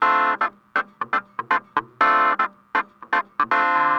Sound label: Clavinet, 70s Analog Funk